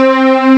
Strings, Amiga Soundtracker; ST-XX Amiga Soundtracker Sample Packs

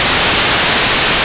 NOISE, wavetables, Korg MS-2000

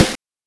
Snare, Genesis; Vectorman

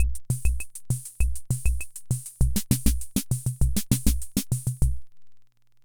Hammond Auto-Vari 64 Loop, drum machine

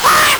LSDj, Nintendo Gameboy; 300 Drum Machines

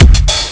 hi bass drum; Amiga Soundtracker Sample Packs

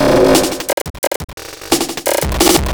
Glitched Beat 175BPM; glitch kit

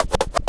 SCRATCHES

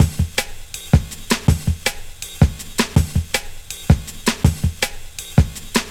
162, breaks, Loops; M8

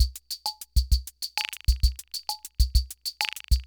Loop, sr-95; classic machines

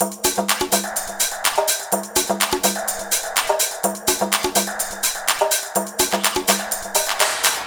DRM, LOOPS 125, House; Best Service ProSamples vol.10 - House; AKAI

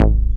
bass attack, techno trance; Ueberschall; Techno Trance Essentials

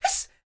YELP, World Voices, ANDES FX

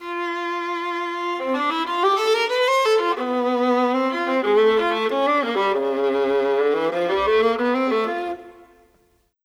Viola, Orchestral; Sonic Foundry (Sony Creative Software); Orchestral VOL-2; Modern 29

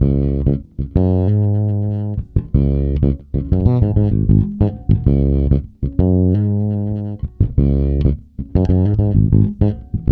C#, funk, bass; Spectrasonics; Bass Legends

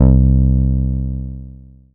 Single Electric Basses, Dance Floor Bass

